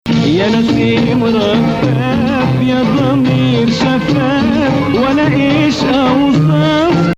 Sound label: Bayati Rast